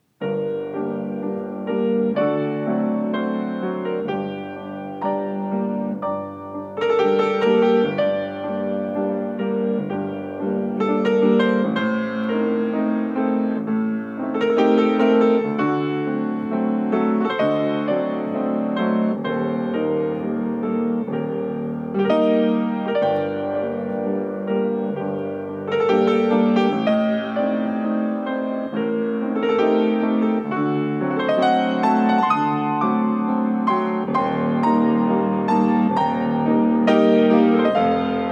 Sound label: tango fondue